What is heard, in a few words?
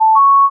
Message Incoming